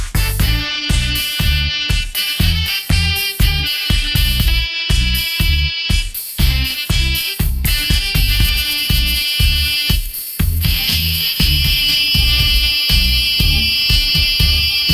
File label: upbeat pop melody, ringtones